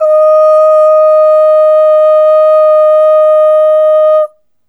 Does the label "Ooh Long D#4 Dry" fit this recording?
Yes